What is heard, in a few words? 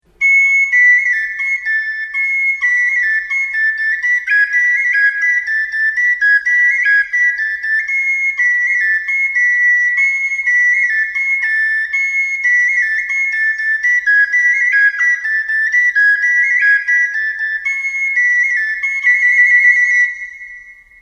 lamzdelis